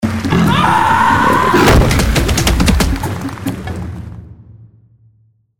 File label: outro; Jaguar; Lucha Rumble